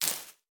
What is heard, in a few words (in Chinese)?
蜘蛛网破坏声